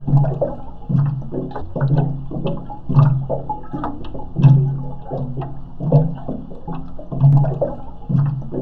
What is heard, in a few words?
GLUG GLUG; Rarefaction; Poke in The Ear With A Sharp Stick